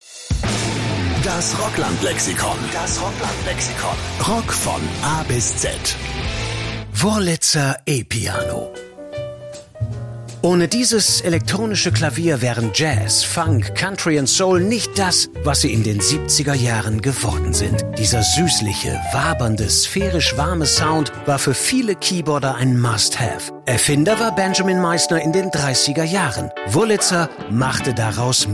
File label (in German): wurlitzer piano; rockland lexikon